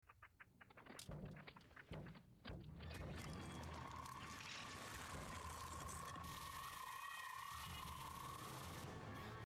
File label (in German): Ruf Megopteran